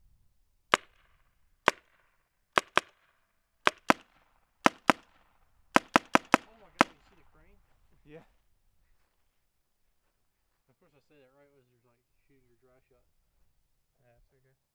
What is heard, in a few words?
spyder, guns; spyder se less clip